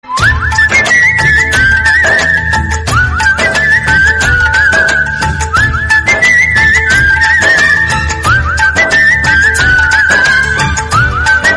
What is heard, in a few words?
lovely whistle background music tone ringtone